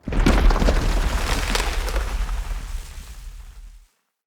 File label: Root Large Stop A; Neathuns